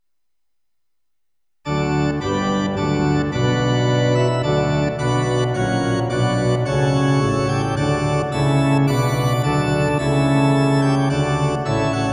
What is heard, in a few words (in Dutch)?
Orgel; Wunderschoen Praechtige